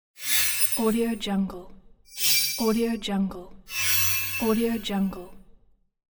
healing tap spell

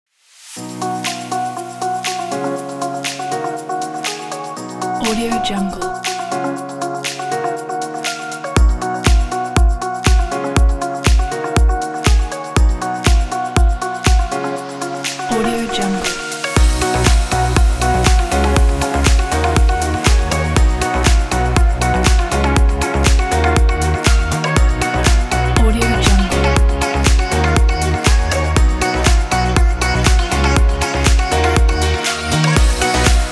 uplifting fun summer pop

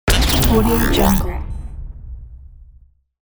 glitch switch

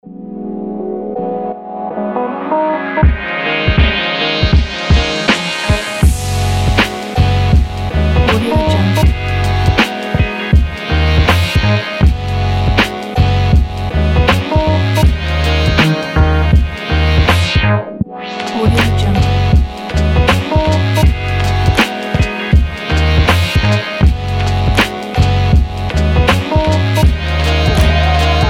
lofi hiphop

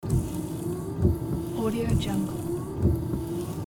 windshield wiper loop

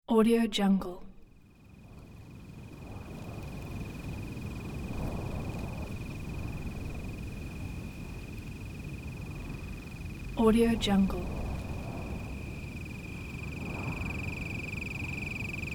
cricket by night

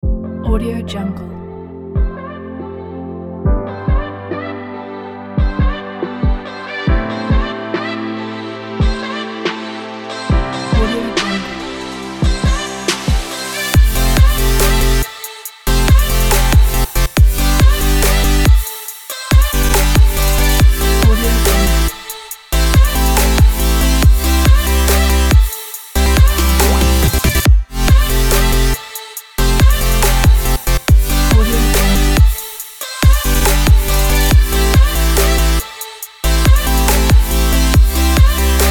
dynamic future bass

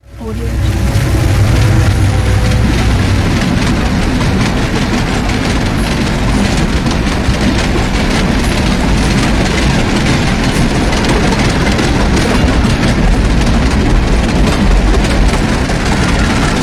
train; terrasseproduction

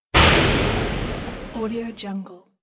gun

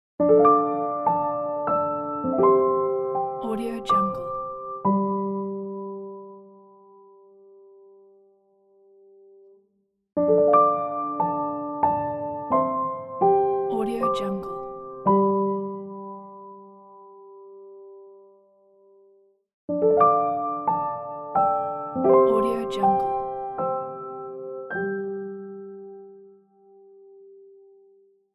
beautiful piano logo; ivanluzan

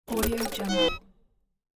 interface reprogram open creaking

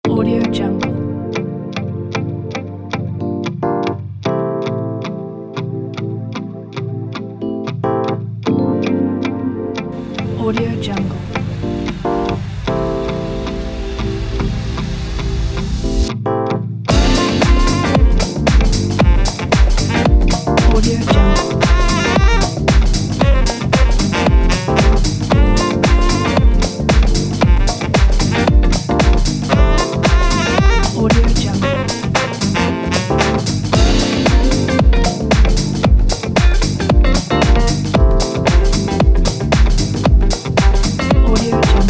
deep house lounge

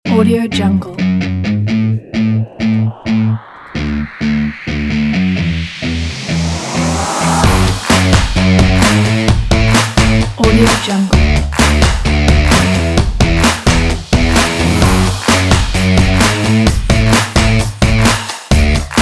extreme sport rock

